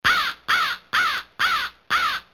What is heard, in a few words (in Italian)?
corvo, versi degli animali; animalandia